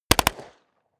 smg uzi 45 medium distant

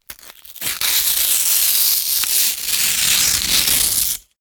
tear

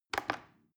plastic button little click regular fast

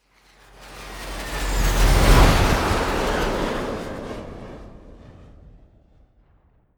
flyby

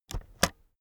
keyboard external space press slow

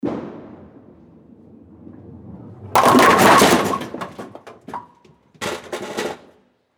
bowling ball all pins

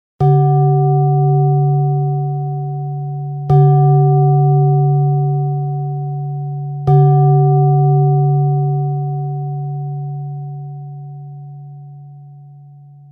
gong

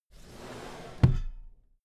drawer cabinet equipment close soft halfway

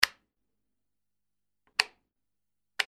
light switch

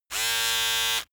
servo

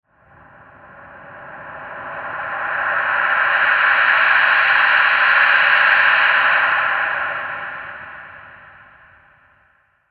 rising noise

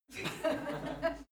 laugh female short

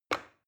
plastic button start release heavy

